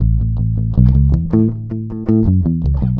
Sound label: bass phases, Loops Phonky